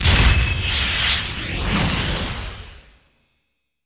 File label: Result Great; Deadstorm Pirates Special Edition; Namco System 357